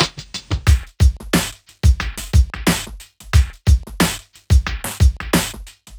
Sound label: Loop